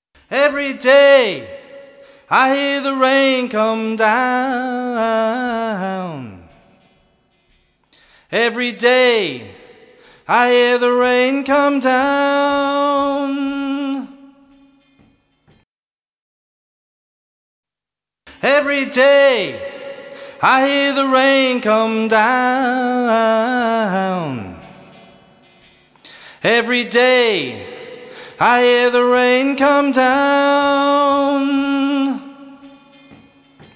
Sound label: vocals